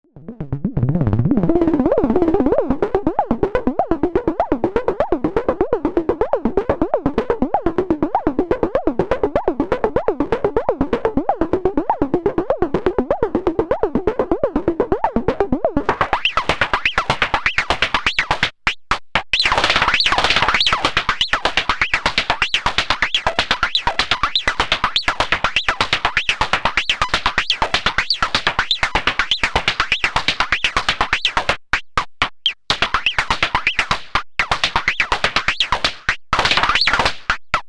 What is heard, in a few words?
Klavis Module Twin Waves Osc1 Sine Phase Parameter Pitch